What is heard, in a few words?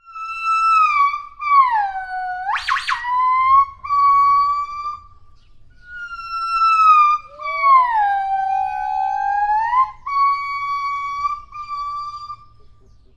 gibbon